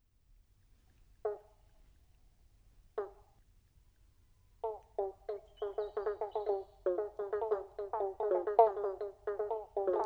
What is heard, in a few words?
western banjo frogs and motorbike frogs; animals